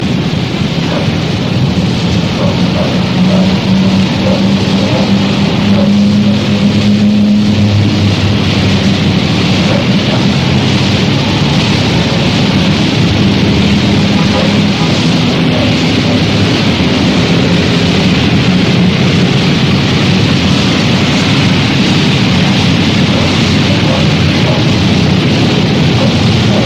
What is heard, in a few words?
rain in the city